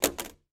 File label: Mouse Fall; MiSide